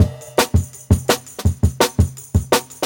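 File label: Jungle Breaks, 168 bpm; Original Jungle Breaks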